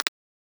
button click; Recode; jewel Quest Riches Desktop

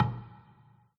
btn spin start; hades River Of Souls Desktop; Fantasma

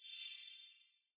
enter game; Skillzgaming; battle royale desktop

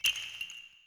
Win; Wu Xing; genesis

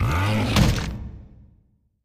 attack; Skillzgaming; battle Mania Desktop

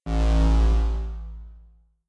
dragon ui; Dragons Myth

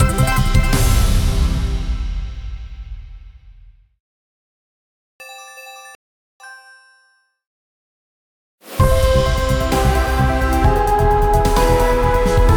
base Scene Sounds; star Dust

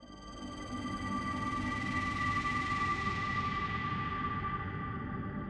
Reel Spin Loop; holly Jolly Penguins; Mahi Gaming

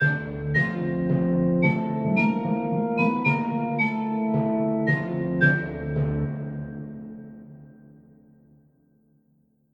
Game Intro; Mirror Magic

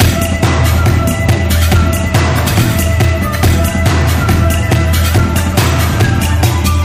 Music Loop; Long Mu Fortunes

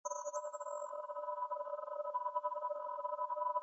reel loop; Rabcat; Classic 243

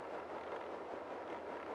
spinning idle; riviera Star Desktop; Fantasma

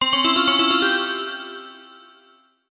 Win; Temple of Luxor

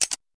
Menu Click; genesis; jasons Quest Desktop

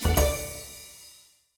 pick pulse; PushGaming; Peter And The Lost Boys; peter Pan

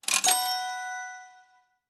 win normal; riviera Star; Fantasma

